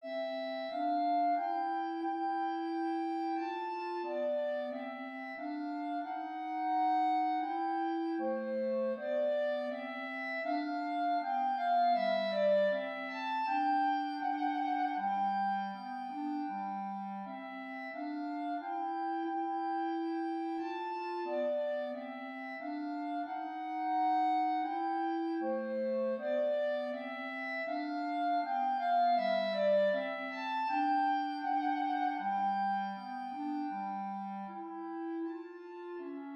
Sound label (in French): menuet